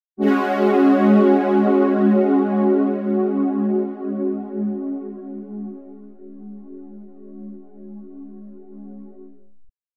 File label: Pad chorus; Dreamstation